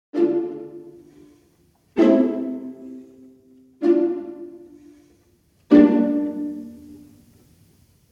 Viola Section Pizzicato; NOVO

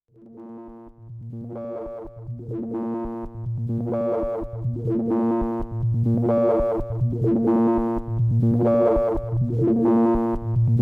Sync Sound

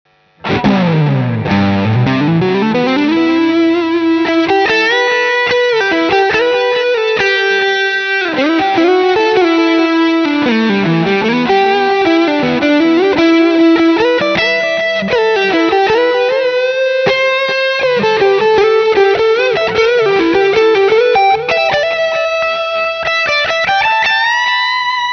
Vintera II Tele Deluxe Lead

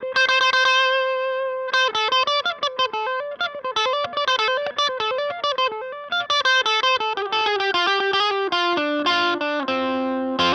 Gibson Les Paul Studio Bridge

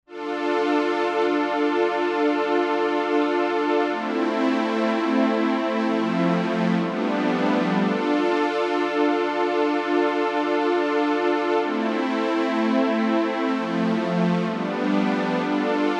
Mini Prophet Strings Original

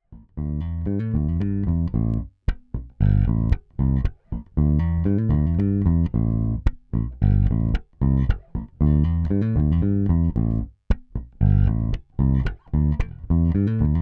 WRA Goliath Tube Preamp

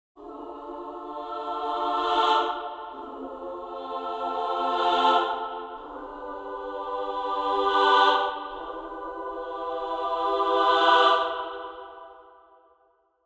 Mod Wheel Dynamics; Strezov Sampling Freyja

